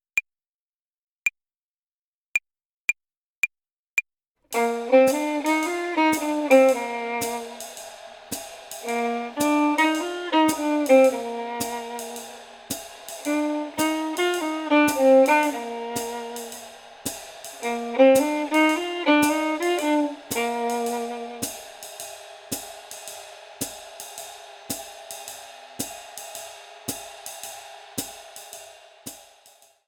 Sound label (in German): Swing-Phrasierung Übung 1, Play-along, Jazz Club Violine